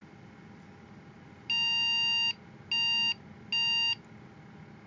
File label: msi motherboard beep sound